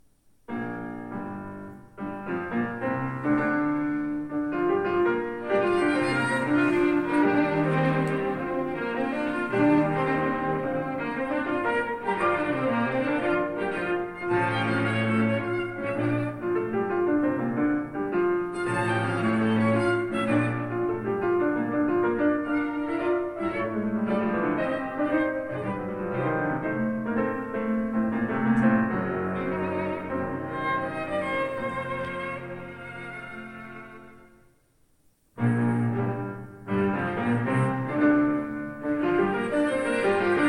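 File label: piano sextet, Piano Sextet in B flat Major; boisdeffre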